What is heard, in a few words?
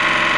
jackhammer